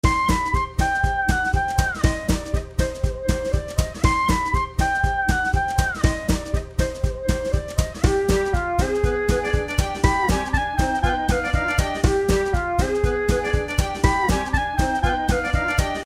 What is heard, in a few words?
loop